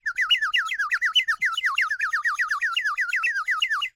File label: Birdwhistle, clay, painted, water, airy, wispy, constant, rapid, stiffled, aggressive; Eiravaein Works; Vaeyan IV